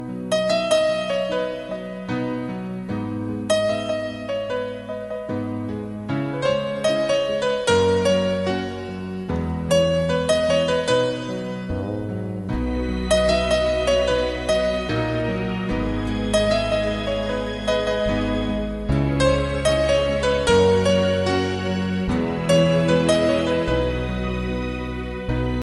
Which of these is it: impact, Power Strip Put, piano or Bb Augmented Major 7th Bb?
piano